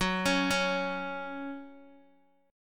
F sharp 5th, down, Guitar; arpeggiated; 4F,4A,5C,5G,5C,6E; JGuitar

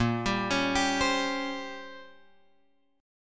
A sharp Minor 6th Add 9th A sharp, Guitar chord; A#m6add9 Chord {6 X 5 6 8 8} arpeggiated

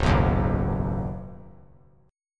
C sharp 9th Suspended 4th C sharp down, chord; C#9sus4 strummed; JGuitar

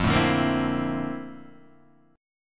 Bb Major Sharp 11th F, chord

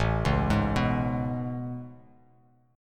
A Major 9th A, Bass Standard; AM9; arpeggiated; JGuitar

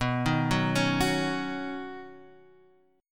B Major Add 9th B, Guitar, Half Step Down

